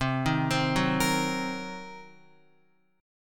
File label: C Minor 7th Flat 5th, Guitar; Cm7b5 arpeggiated; JGuitar